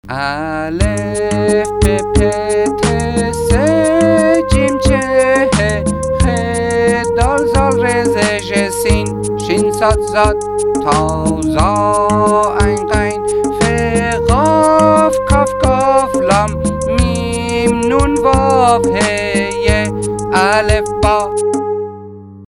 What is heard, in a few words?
alefba song music